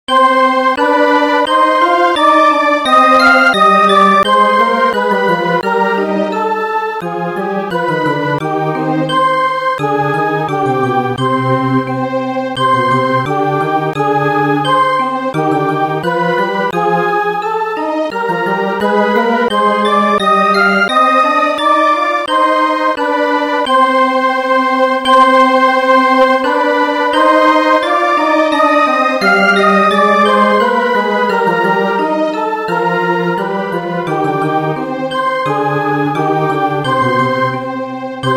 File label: orchest; Palindrom (oda-vissza) dallamok